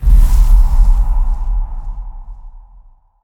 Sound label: shadow create start